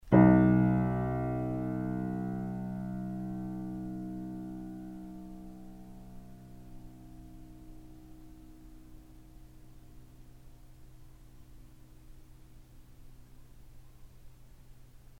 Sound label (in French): Do1 vrai Gaveau, piano